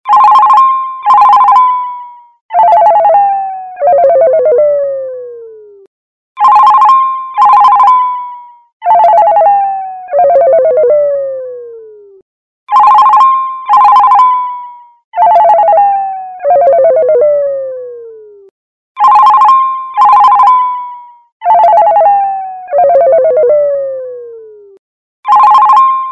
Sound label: Crank Ring